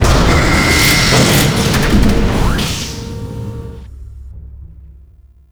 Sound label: Elevator Start; Warframe; Mod Quest; Teshin's Hideout; Teshins Dojo